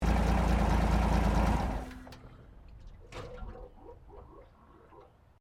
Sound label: Car Holden FE Bonnet Open Shutdown; Holden Fe 1957; Classic Australian cars; Vehicles